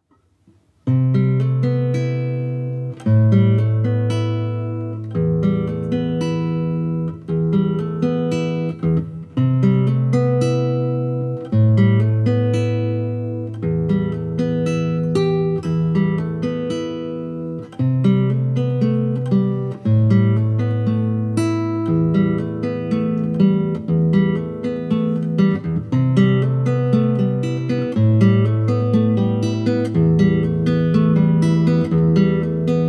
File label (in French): Classe guitare